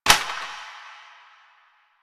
Plastic Drop; Warframe; NineteenNinetyNine

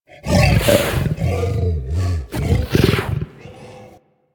Juggernaut Truffle Fidget, Infested; Warframe; Truffle Hunt

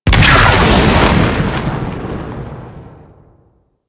Grenade Launcher Explosion; Warframe; Weapons; Corpus

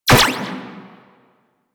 Shot, Bladed Pistol; Warframe; Tenno; Akjagara Prime

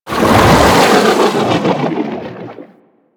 Aqua Board Move Stop; Warframe; Yareli

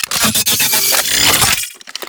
Sniper Rifle Mode Switch Retract; Warframe; Weapons; Corpus

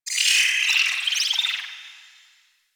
Landscape Glowing Tree Insect; ambience; props; one offs; Warframe; Venus Open World

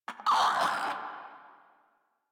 Infested Crawler Hear Sound; Warframe

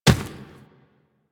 Inf Medium Run Dirt, Infested Footsteps; Warframe

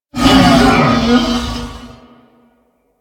Wolf Death; Animals; Warframe; Quests; Duviri

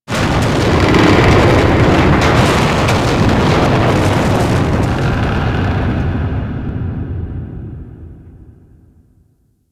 Star Base Large Rumble C; Warframe; Ambience; Star Base Intro

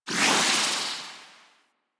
Alchemist Distill Ability Loop End; Warframe; DE; Warframes

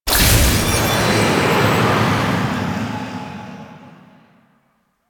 Floating Item Pickup; Octopede; Warframe; Entrati; Swarm

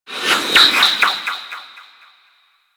Bark; Warframe; Teralyst; Vomvalyst

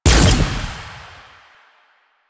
Fire Shot; Duviri; Incarnon Adapters; Incarnon Dex Sybaris; Weapons

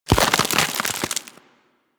Ice Wave Spawn; Enemies; Abilities; Warframe; Corpus; Arachnoid Camper Terra

